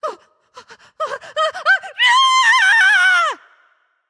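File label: fear, female voice; smite; voicepack; hera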